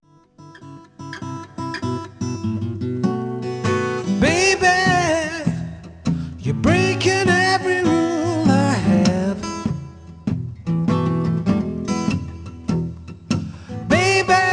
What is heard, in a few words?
BLUES GUI